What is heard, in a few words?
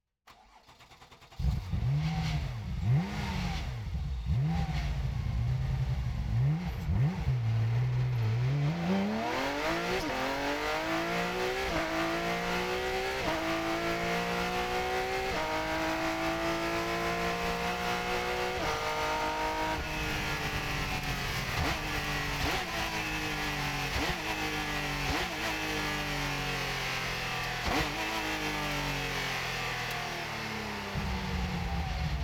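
Porsche, 997, Cup, Onboard, Start, Drive, Gearshifts, Stall, Drive, Stop, Off, Engine; 2012; Pole Position; PZM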